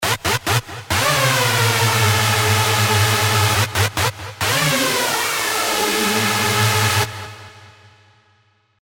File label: Hoover, Sylenth1; Smileys; alonso 18 year anniversary pack